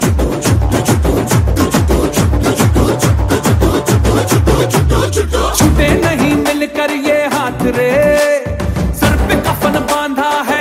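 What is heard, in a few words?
hindi song ringtone, bollywood ringtones; sholay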